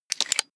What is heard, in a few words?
metal rattle lock